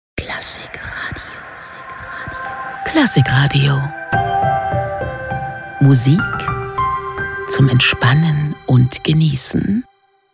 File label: Station ID